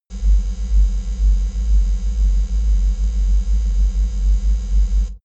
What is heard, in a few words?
space alien machinery